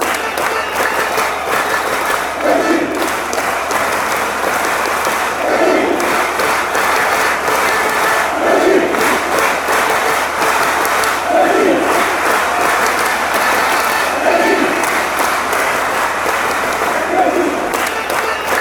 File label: czech claps, fanchants